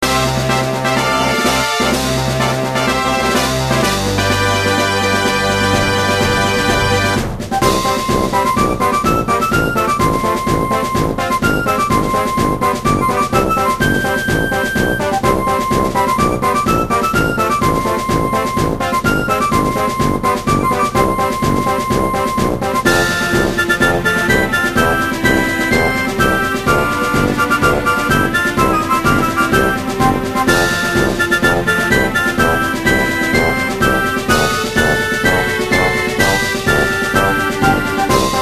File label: Victory; Mario & Luigi - Partners in Time